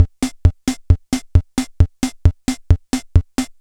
Yamaha PS-300 March

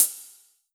Yamaha RM50 Closed Hi Hat

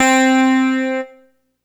Ensoniq SQ-1 Dyno Keys C4

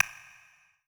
Stick

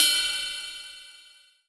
Roland SC-88 Ride Bell

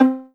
Kawai K1r Pizzicato C4

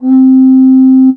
Casio CTK-611 Recorder C4

